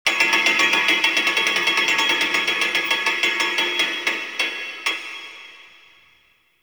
grid loop; Gameplay; Warframe; Entrati; Vaults

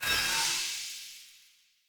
Dagger Recall Button Press; Weapons; Player; Swords; Fey

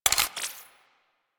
Blastor Pistol Mech; Warframe; Weapons; Corpus

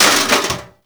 Metal Damage; Warframe

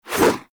Melee Whoosh; Warframe; Sentients; Brachiolyst

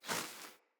Wing Flap One, Animals; Floppet

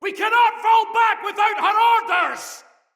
Fearful Cry Afar, Soldier Male; Dendrit

